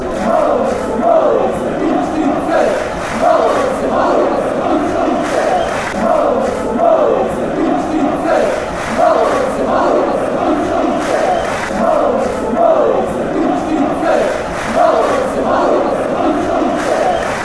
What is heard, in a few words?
bosnia chant, fanchants